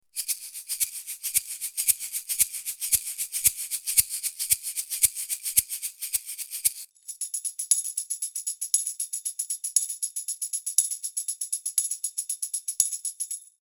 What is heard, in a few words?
Heil, vocal mics, perc